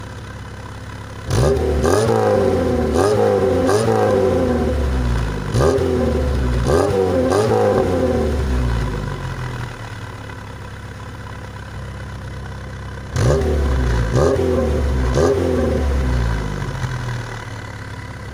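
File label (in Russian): zhiguli vyhlop, zvuk avtomobilia zhyguli lada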